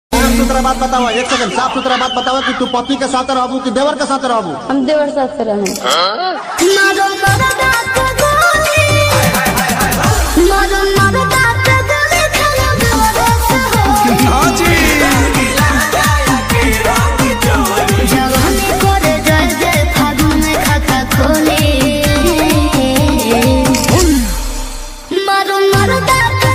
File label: Holi Song